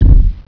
body hit; ambience

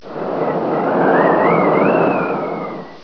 rebar wind; ambience

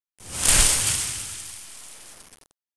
brush movement

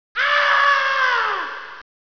m scream

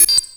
beep

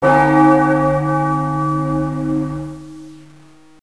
church bell

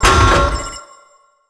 keycard

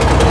crane idle loop; ambience

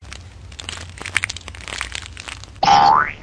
snake hang

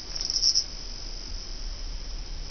western katydid clean; ambience

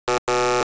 error

